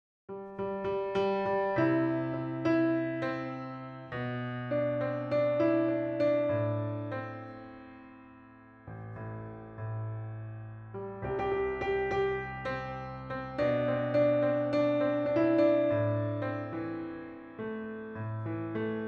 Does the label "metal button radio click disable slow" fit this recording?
No